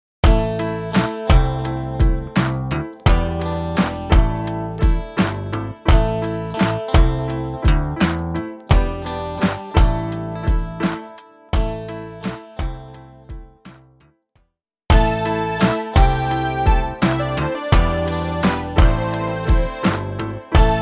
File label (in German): Instrumente; Hoerraetsel